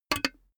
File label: cash box metal lid close; maschinen; soundpool